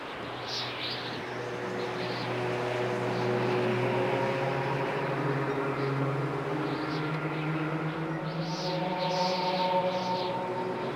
monastery (past) - exterior

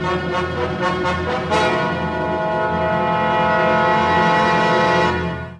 Puzzle 3d Jingle Danger Sign

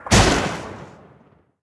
Warmonger Mage Attack

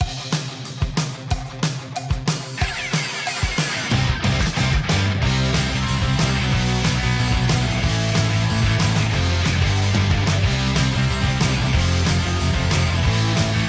bgm Comical; Fist of the North Star Lost Paradise; Hokuto ga Gotoku; PlayStation 4 gamerip

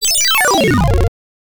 Coin; R-Type; X68000

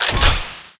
Pickups

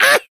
Boss Zombie Hit

